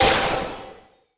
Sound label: Gravity Switch FX Am7; Video Game; Cymatics; Video Game Sample Pack Vol 1